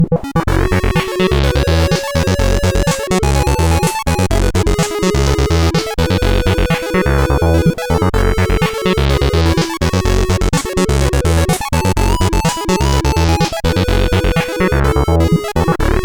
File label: 25Hz, CSG8580R5